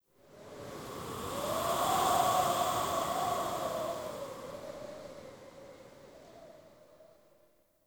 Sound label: Ext Wind Gust Calm Toxic; Ambience; Misc Outdoor; Warframe; Infested Eris